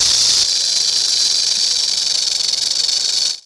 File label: rattlesnake